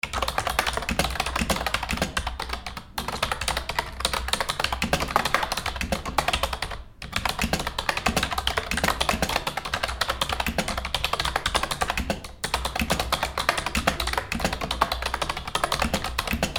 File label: computer keyboard